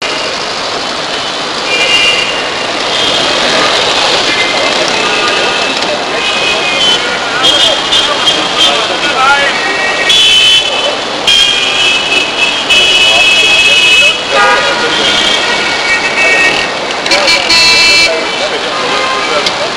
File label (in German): gehupe